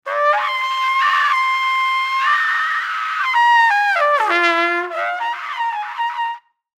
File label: Trumpet Jazz, Trumpet Jazz Squeak Bad; Cartoon; Musical; Cartoons; Blastwave